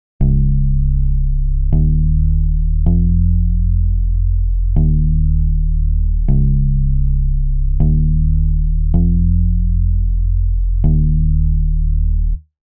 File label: bass